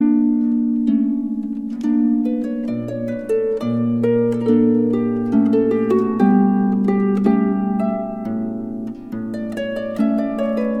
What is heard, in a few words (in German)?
italienisches konzert harfe solo